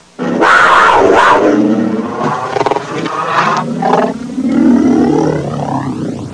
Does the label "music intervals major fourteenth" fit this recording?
No